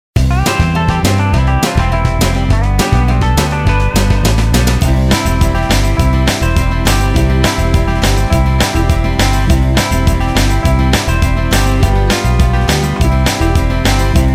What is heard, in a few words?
where is it phonics karaoke